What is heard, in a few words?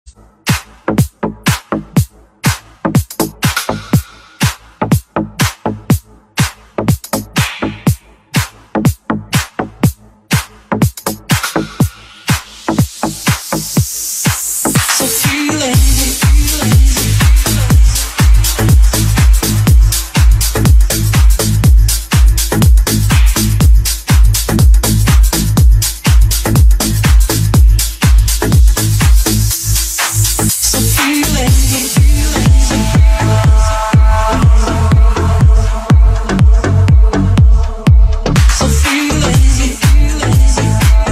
Deep House Vocal Session